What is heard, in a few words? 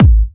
Bassdrums UK Trance Kicks